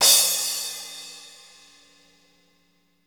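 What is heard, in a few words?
CYM CRA, crash cymbal; 90 s Sample CDs; Sound & Vision - Gigapack I CD 1 (Roland)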